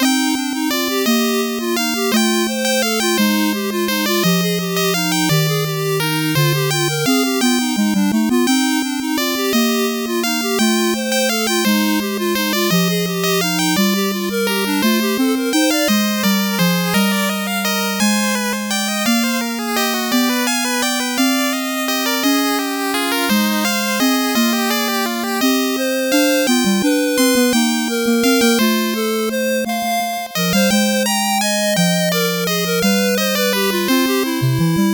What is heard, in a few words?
8bit bgm fast